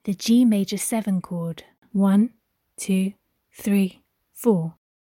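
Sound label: G major 7 chord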